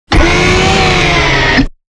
Robotic Movement; SoundsCrate

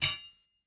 sword hit; soundscrate

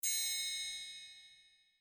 triangle hit

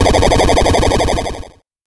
chasing; video game; video game bundle; soundscrate

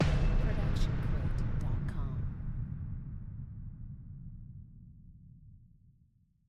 Epic Hit; soundscrate

